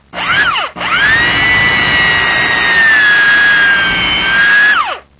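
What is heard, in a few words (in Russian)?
дрель работа перфоратора скрипучий близкий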